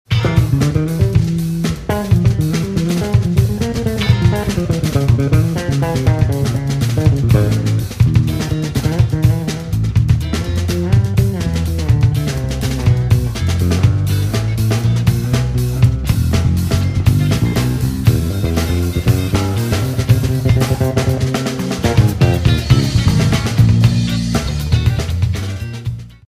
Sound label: Bassolo